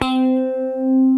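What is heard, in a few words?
phaser C5, chorus guitar; East West - Global Sounds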